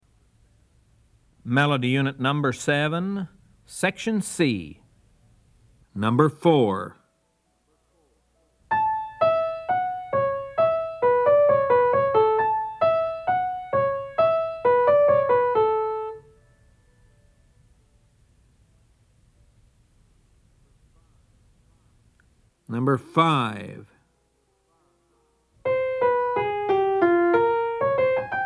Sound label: melody, ear training recordings